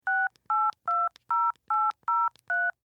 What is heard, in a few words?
Telephone Cordless Dial Beeps; Technology; Electronics